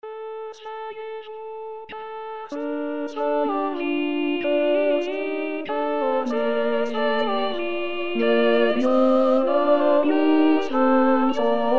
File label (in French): oiseaux tutti; 2016 st jean